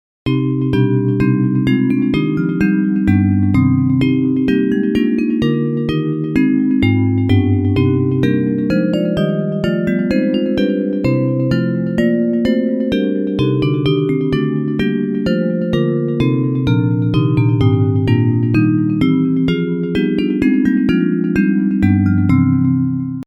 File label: Bells